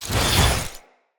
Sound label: Dendrit Staff Caster Charge Heavy Start Long; Weapons; Staves